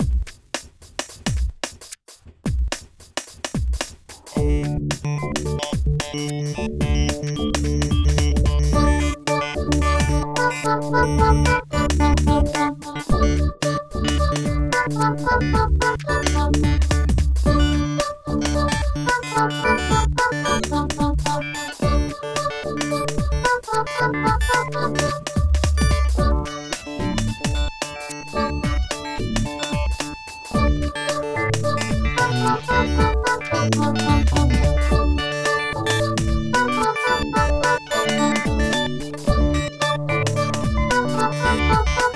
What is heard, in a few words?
saxophone; mib